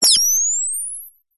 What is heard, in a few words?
science fiction night vision goggles or equipment switch on high pitched whine; zapsplat